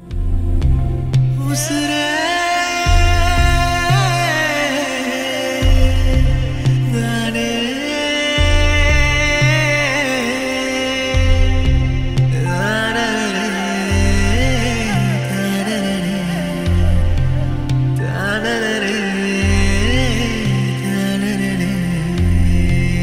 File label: Tamil Ringtone